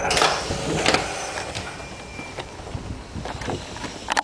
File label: klaxon, Train; RBDe560